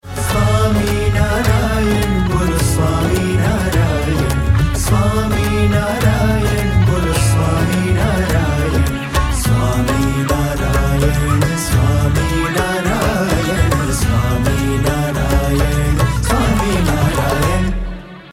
swaminarayan bolo swaminarayan hum sab shreeji ke santan dhun